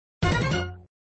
Sound Extra ball sound alert (usually x4), flipper; Extra ball sound alert (usually x4) v1